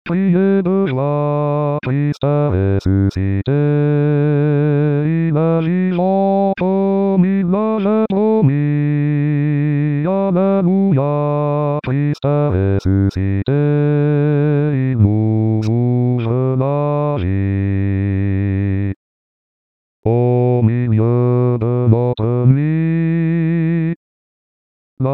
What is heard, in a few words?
Criez de joie Basse, Chorale